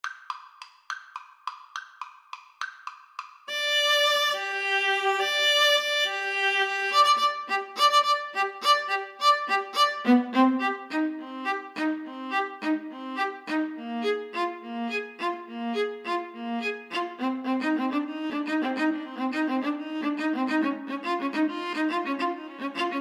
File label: Violin Viola Duet